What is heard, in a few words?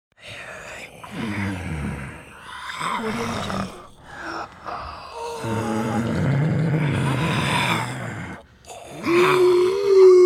zombies group moans